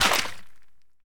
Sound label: mud step; Golden Crayon Sounds